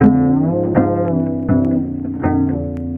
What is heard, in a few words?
bass, Loops